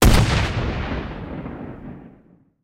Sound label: aa 37mm fire, guns; War Thunder